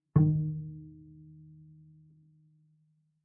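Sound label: Bass pizzicato fortissimo sul D Eb3, Double Bass; MIS Pitches - 2014; Strings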